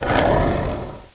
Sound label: Skateboard Stop; Skateboardkid; Playable Tutorial Level; TR1